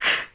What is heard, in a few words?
Poke Belly; talking tom cat 2 android ios online gamerip 2011